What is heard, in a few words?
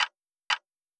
TRAFFIC LIGHT RED; SIMUZENSOUND